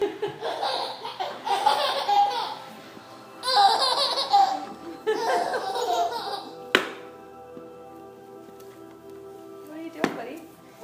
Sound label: laughter